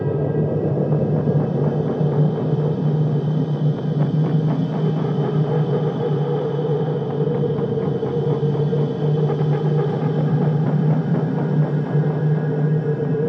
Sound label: Abandoned Station Heavy, Horror Atmospheres Music; Miasma - Horror Atmospheres Music Pack